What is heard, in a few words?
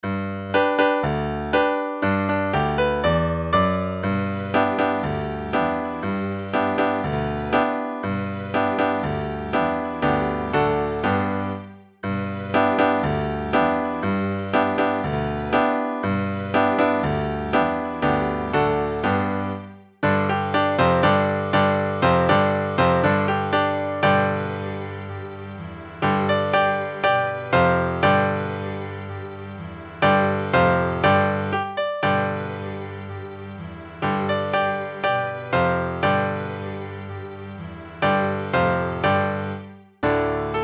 Hambone 120 BPM; Jump Start